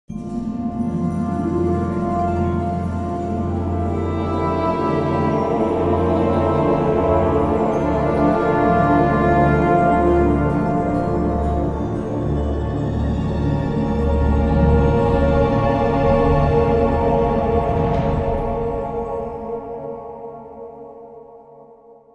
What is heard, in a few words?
crypt music; egyptesc